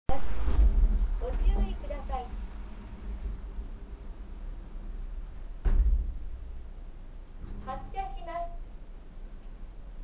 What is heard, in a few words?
run; sakurarail